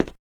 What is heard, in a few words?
tile, footsteps